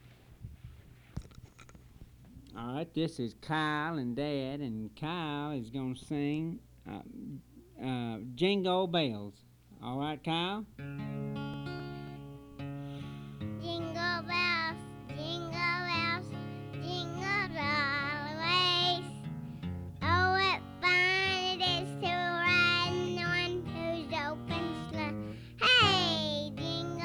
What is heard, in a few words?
Jingle bells